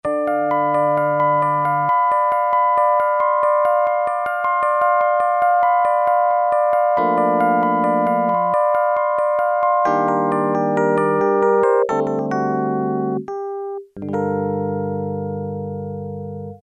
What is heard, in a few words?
New Electro, Yamaha TX81Z